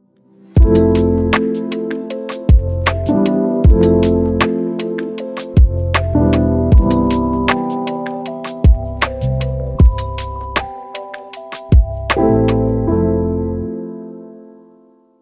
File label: relaxing chillhop